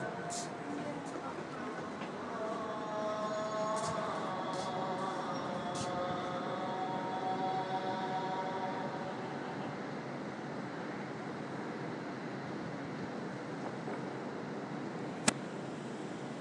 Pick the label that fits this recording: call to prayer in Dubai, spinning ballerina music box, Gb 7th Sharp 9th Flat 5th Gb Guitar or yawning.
call to prayer in Dubai